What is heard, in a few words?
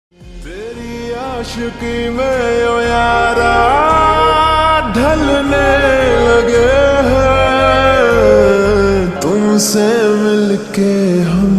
Slowed Reverb Ringtone